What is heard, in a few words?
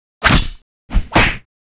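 Mob attack; Monster; 흑룡방; 흑풍장주; 2단계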